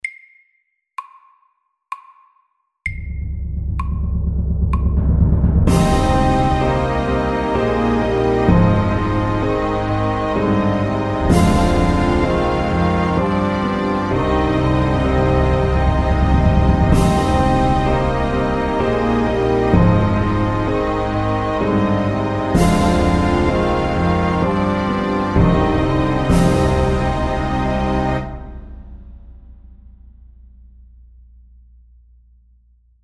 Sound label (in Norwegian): Filmmusikk SLOW 64